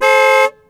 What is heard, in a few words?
big horn, 汽车; 交通